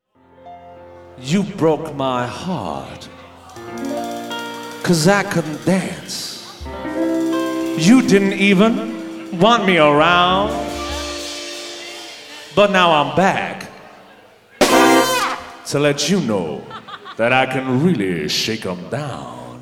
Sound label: Swing Band, Live